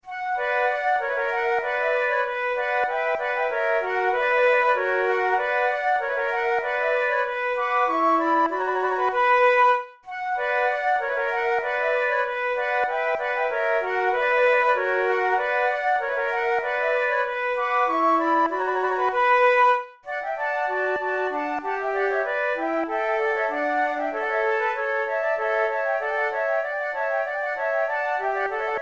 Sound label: sonate en trio, rondeau, flute